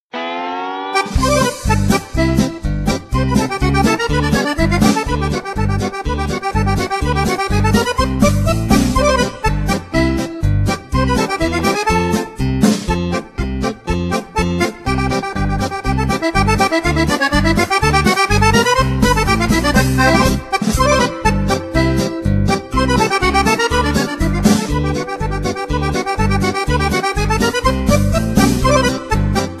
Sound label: polka; cartoon